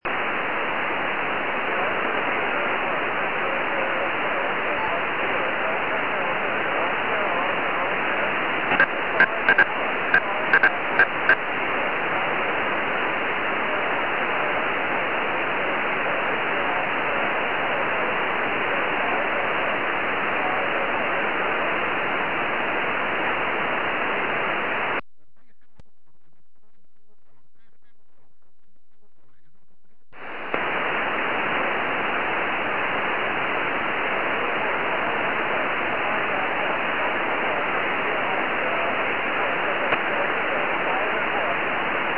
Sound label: 70cm, tropo